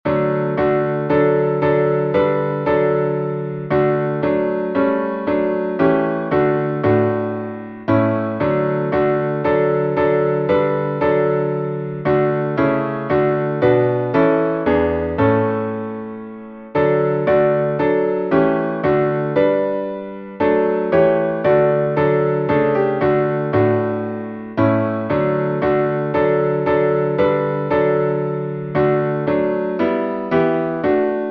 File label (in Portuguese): salmo instrumental